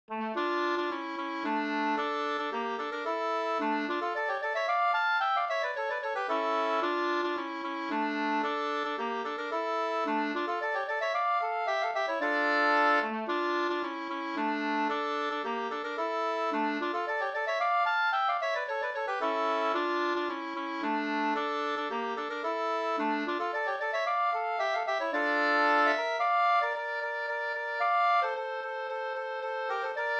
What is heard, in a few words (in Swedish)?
polska d moll